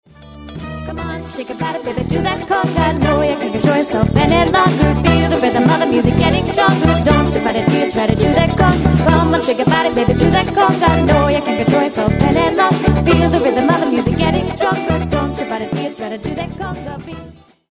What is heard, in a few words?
Conga